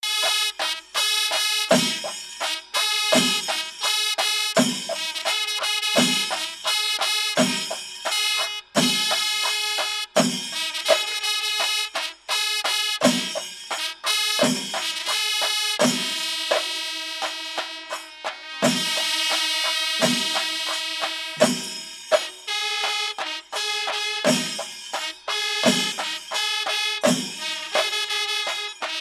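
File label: Kerala Own Instrument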